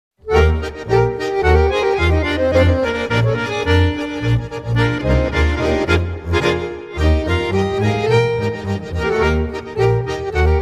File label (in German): Änteli-Marsch